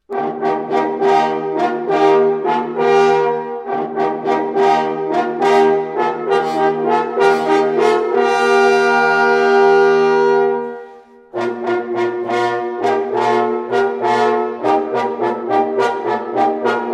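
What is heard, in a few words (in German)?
HALALI Jagdhornbläser; Mals; Eustachius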